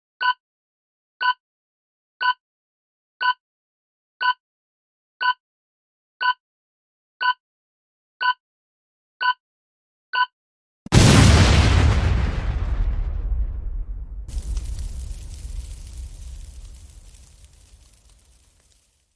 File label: 10 Seconds Time Bomb Countdown with Sound; guns